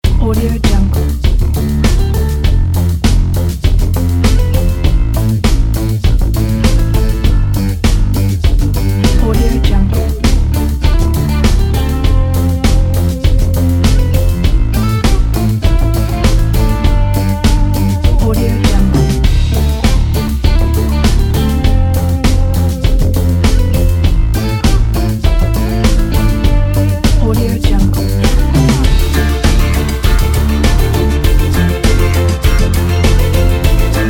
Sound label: 128bit upbeat